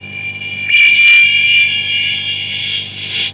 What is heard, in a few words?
Alien